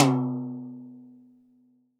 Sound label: TD-20 Tom B, Roland TD-20 Toms